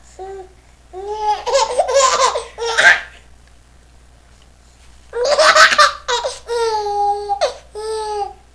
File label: laughing